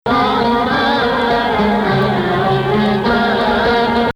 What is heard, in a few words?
Hijaz, maqam